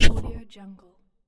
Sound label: game futuristic impact sound